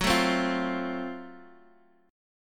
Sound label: Gb Minor Major 7th Flat 5th Gb, guitar; strummed; x,x,4,2,1,1; JGuitar